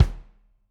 room Kick, drum

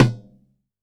Tom, drum

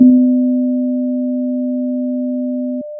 Sound of a church bell

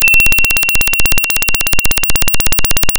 pop, Sound of a school bell

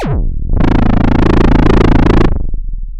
Sound of a chainsaw

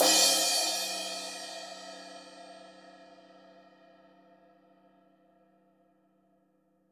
Trash, drum

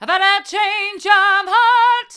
i've had a change of heart hard, vocal

